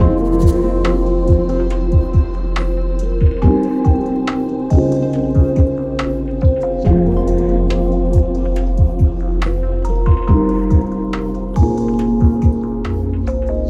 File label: Chilled; Dolby